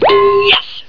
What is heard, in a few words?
Correct